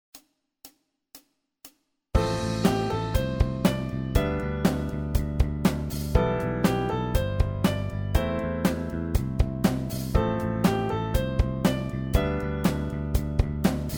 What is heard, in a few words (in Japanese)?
ベース 非 ルート